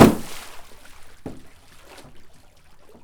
water slap

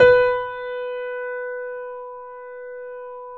B 3, Keyboards Acoustic, GRANDP ST; USB Soundscan vol.17

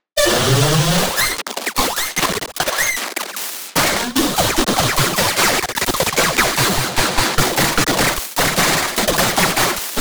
failed glitchstep attempt

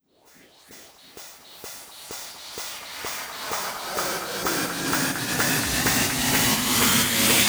FX Uplifter; VEC5 Multi Loops

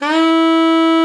SLID E4, ALTOSAX; Xsample VOL-2

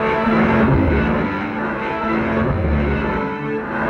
Burning, Electric Guitar; Sonic Foundry (Sony Creative Software); Friendly Fire; Wrath

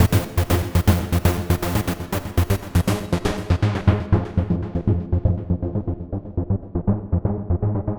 Trance Synth

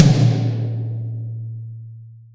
toms, Real Mega Drums

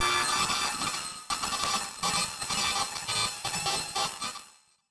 Battered Synth; malfunctioning synth samples

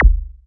BD-Analog, Korg Radias

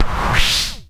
WD2 NZ SWP, WAVDRM HITS; Spectrasonics; Liquid Grooves; WAVDRM HITS1

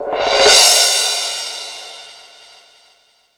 Crash, Drum Machines E-MU Pro-Cussion

